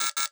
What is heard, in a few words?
error message; Escape from Tarkov